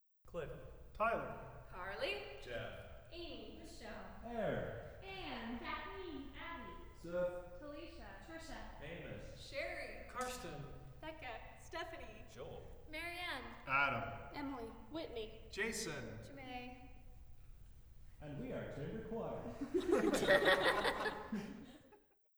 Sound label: Choir Test F; kimber kable Isomike Tests 2005b